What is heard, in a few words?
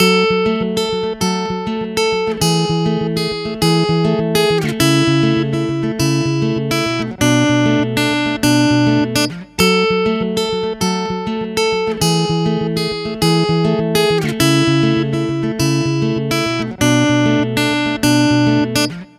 Guitar 100 BPM (Fmin); Godsend; Ghosthack; Guitar Loops & Shots